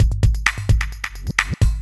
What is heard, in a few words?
electro kit 130